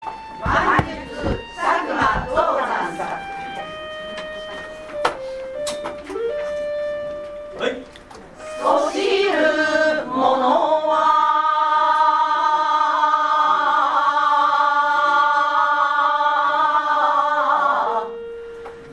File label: manjutsu gogin lady